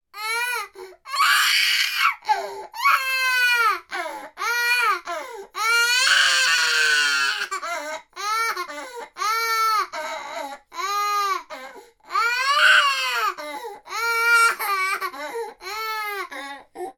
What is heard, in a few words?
human 18 month toddler boy crying